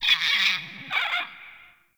Forest Bird Mid; Ambience

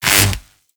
Mining Laser Fire Stop; Weapons; Eidolon Plains; Warframe; Eidolon Mining Lasers

